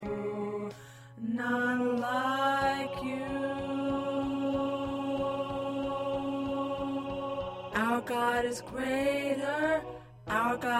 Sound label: Alto